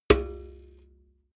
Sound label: metal bucket hit hard